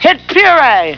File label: hit puree